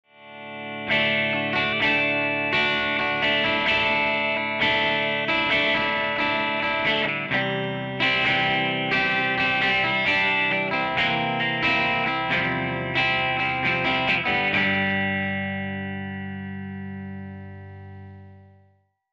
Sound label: clean test